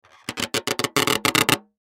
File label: Party Balloon Rub Scrape Rubber Cartoon; Blastwave; Foley